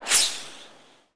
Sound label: carbine whizby